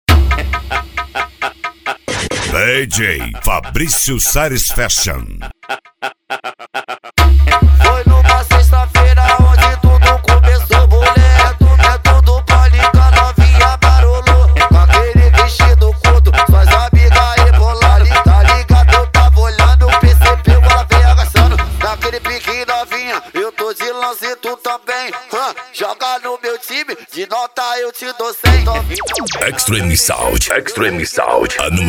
funk

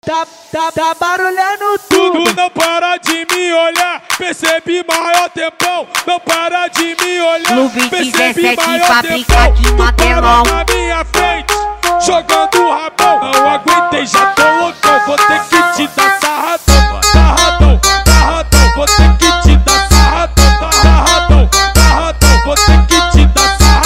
especial funk; som e acessorios

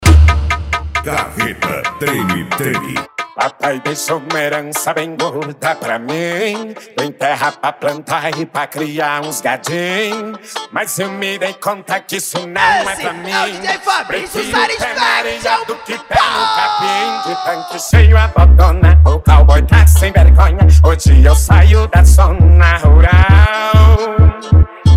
funk mega bass

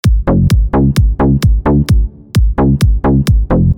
base mega funk; pack de bases

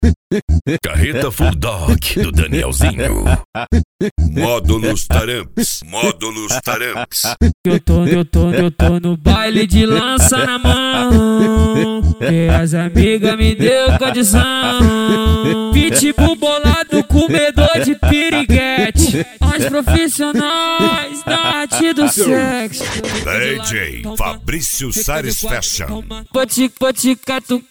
funk

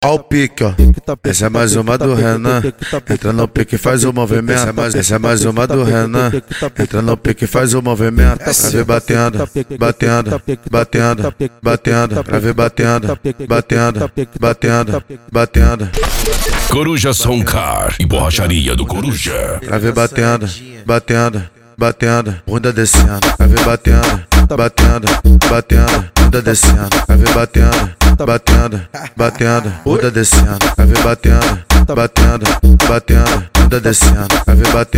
funk; coruja som car e borracharia do coruja

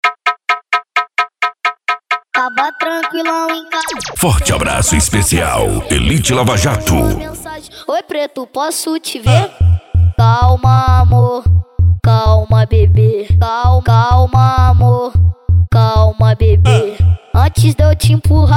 funk mega bass

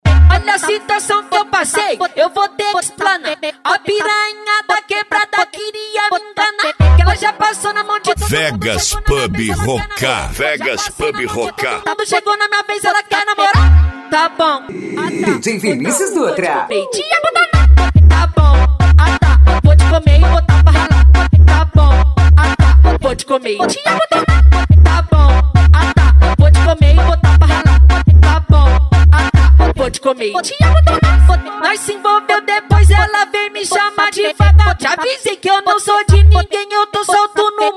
funk mega bass

